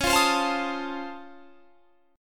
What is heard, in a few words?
C sharp Augmented Major 9th down, guitar chord; C#+M9; strummed; JGuitar